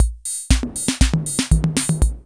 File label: FILL, KR55; INIS; Funky Xtreams